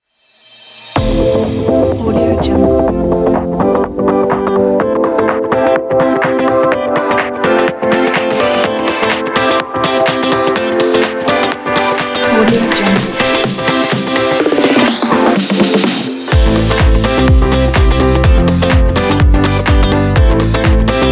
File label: upbeat pop pack